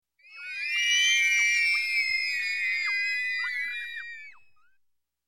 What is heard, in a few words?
Children Outdoor - Girls, Screaming, Playground; Sound Ideas 2000 Series